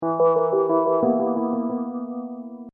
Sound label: Hip Hop Dark Rhodes Licks 90 Bpm Straight B